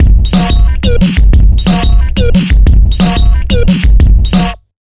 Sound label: bumpstarter 090bpm, Downbeat Dance Grooves; Zero-G